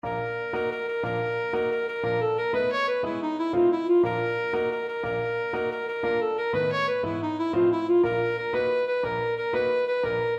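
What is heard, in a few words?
gottschalk tarantelle alto saxophone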